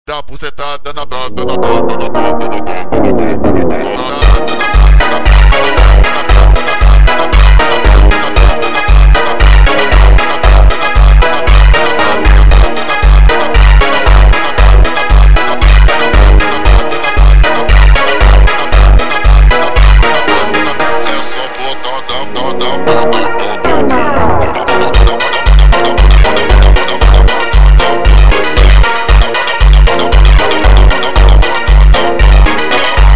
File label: Montagem slowed